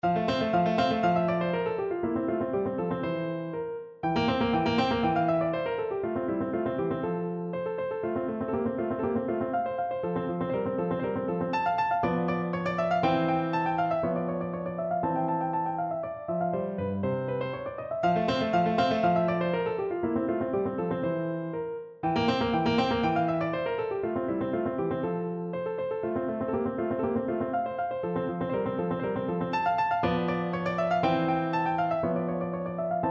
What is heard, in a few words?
piano